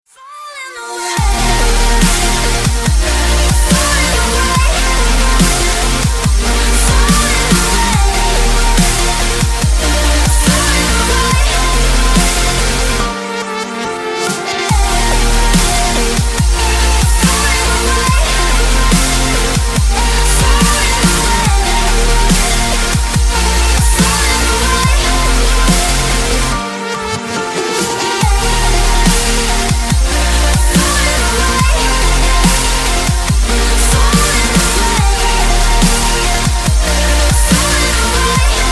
dubstep remix

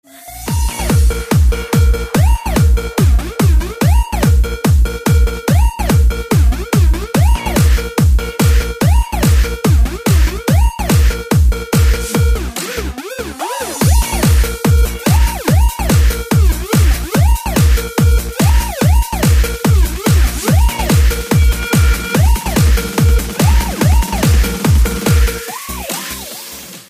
electro remix

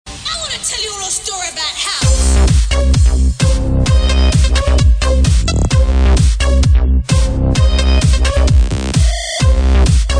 house music

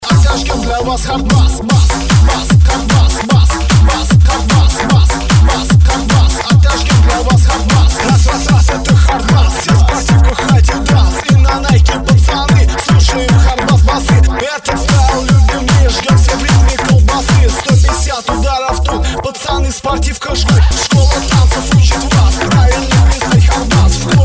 hard bass; iz fil ma okolofutbola

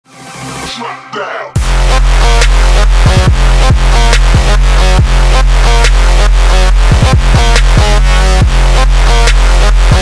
trap remix

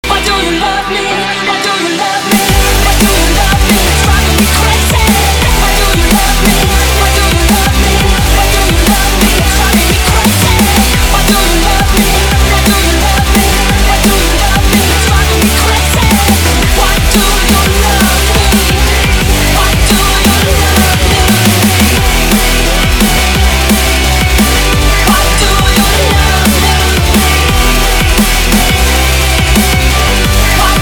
dnb ringtone

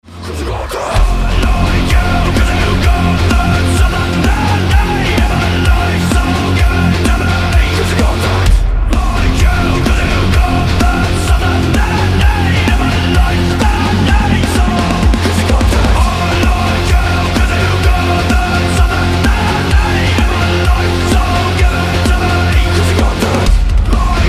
epic metal cover

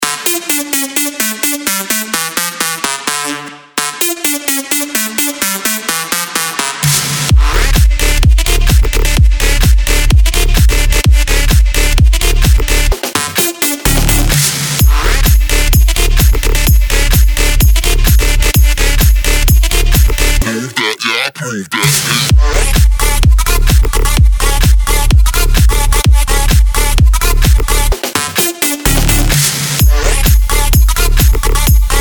dutch house

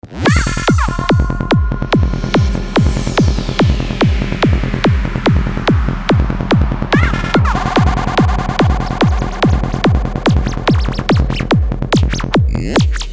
brutal frequency

